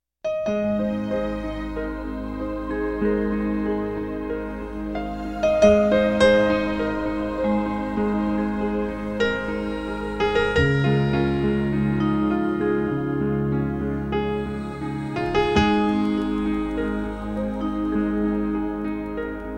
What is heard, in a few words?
piano